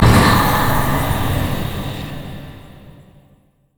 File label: heal; tides of blood; LoL; vladimir